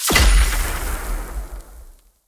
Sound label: sand explo